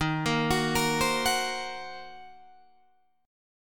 Eb Minor 6th, Guitar DADGAD; Ebm6 arpeggiated

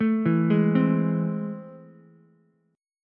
A Minor Major 7th, down, Ukulele; arpeggiated; AmM7; JGuitar

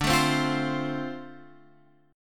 D-Minor 7th D, chord

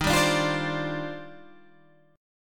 D sharp Major 13th, down, Guitar, Drop D

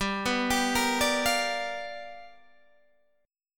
G 7th Sharp 9th G chord, Guitar Open G

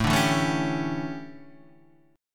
D Major 7th Suspended 2nd Suspended 4th, chord

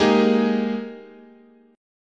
Ab Major 7th Suspended 2nd, chord, guitar

